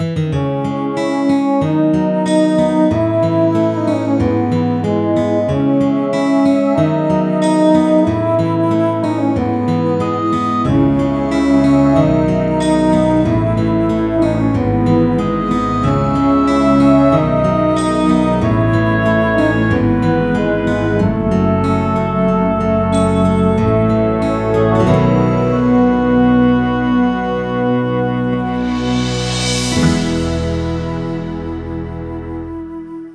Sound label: Lullaby